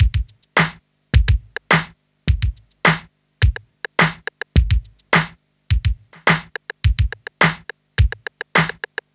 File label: Club Tip, R&B Drums